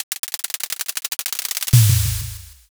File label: drumroll